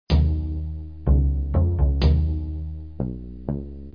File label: music; Egoboo